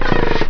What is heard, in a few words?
wall fall